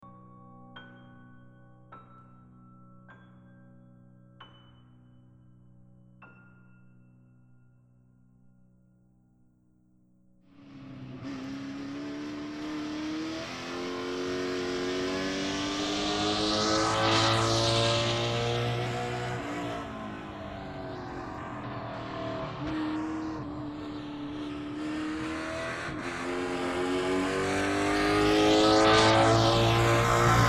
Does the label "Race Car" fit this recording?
Yes